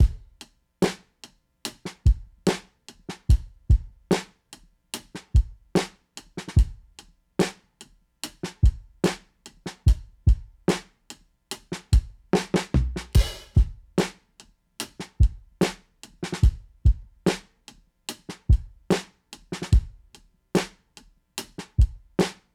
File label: smokey 4 4 73bpm wet; indie folk; indie folk vol 1 taster pack